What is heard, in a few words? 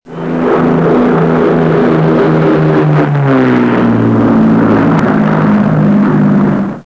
Mosquito, aircraft sounds; March Aviation Society; Airfest; March ARB